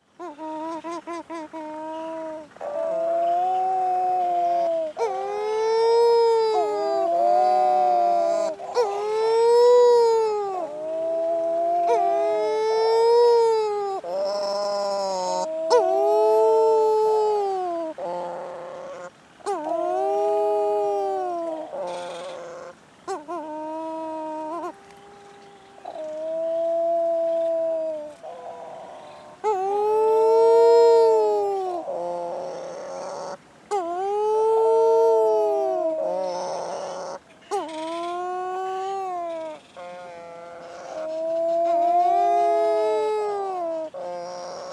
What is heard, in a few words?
Wedge tailed Shearwater Ardenna pacifica